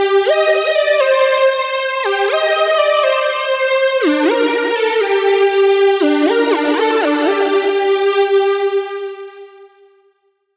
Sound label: Fat Line, Funk; Dr. Fink's Funk Factory